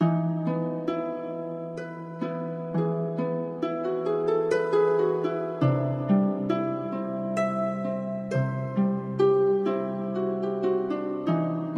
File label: Harp Loop